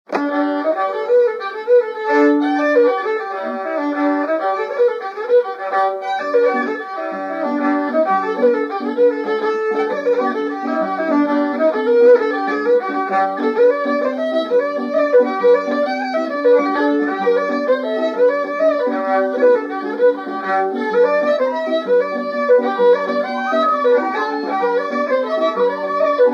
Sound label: jig; Health to the ladies; Thrush in the Straw